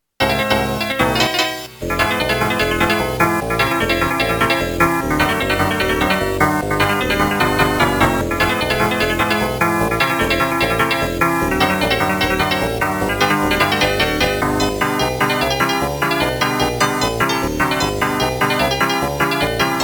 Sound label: 8-bit sample; SMW